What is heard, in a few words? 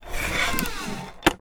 empire game profession wooden lever machine hinge switch; creak